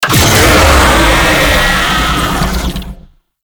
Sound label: Infested Vocal Player Spotted; Warframe